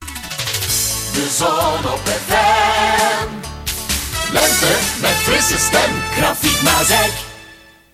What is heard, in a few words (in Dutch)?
Jingle lente; Gezongen lentejingle. Samenvatting: zon op FM, lente.